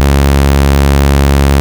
Ob Saw, Synths; Multisamples; Ableton Live 12 Lite; Core Library

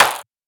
Clap Foley, Drums; One Shots; Ableton Live 12 Lite; Core Library

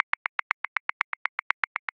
Rock Slow and Smooth 16ths; Grooves; Ableton Live 12 Lite; Core Library

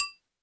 Cowbell Small; One Shots; Drums; Ableton Live 12 Lite; Core Library

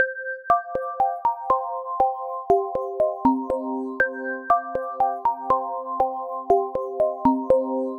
Arp; Sony; ACID Pro 7.0

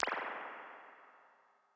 Springs Oldschool Spring; Impulse Responses; Ableton Live 12 Lite; Hybrid

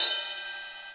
Ride Bell; One Shots; Drums; Ironman

